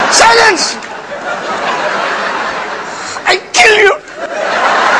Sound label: silence; svencoop